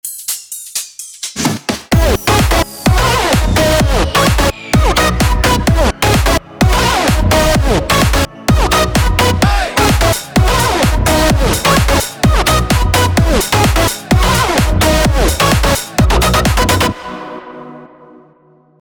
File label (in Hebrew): דרופ